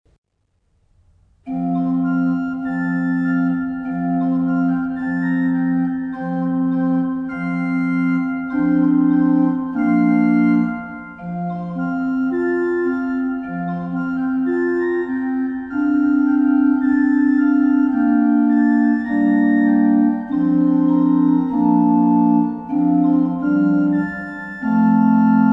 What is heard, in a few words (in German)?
orgelvorspiel; Fröhlich lasst uns Gott lobsingen